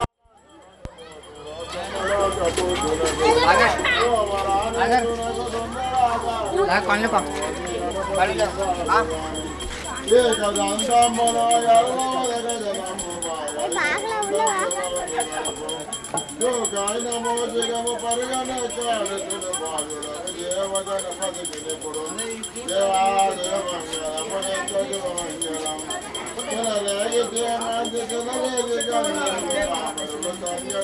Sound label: Priest Chanting In Telugu